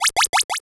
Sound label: Cry